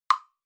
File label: Woodblock Mid